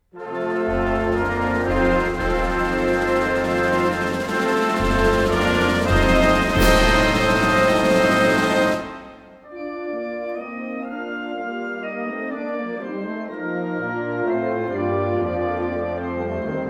Anthem of Europe US Navy instrumental long version